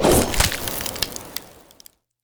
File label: rend slash cold, dragons attacks; creature; psfx